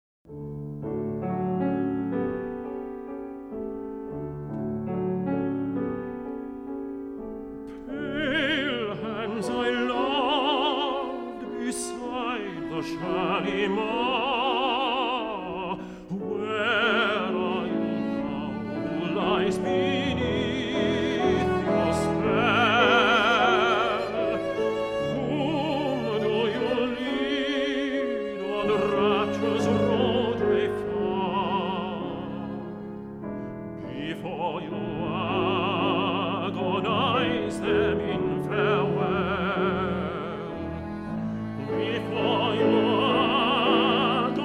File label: Kashmiri Song